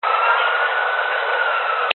technology cb radio burst static city background siren